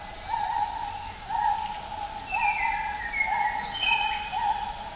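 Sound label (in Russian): джунгли активные звонкие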